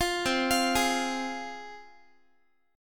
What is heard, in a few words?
C Suspended 4th Sharp 5th, C, down, Guitar; Csus4#5 arpeggiated; 20,20,20,18,x,x